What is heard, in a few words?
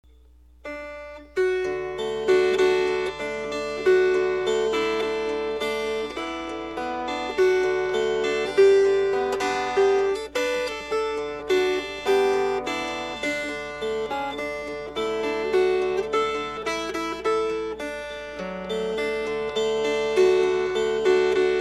Fingerpicked